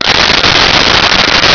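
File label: voice tauntaun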